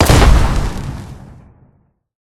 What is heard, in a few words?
blast hit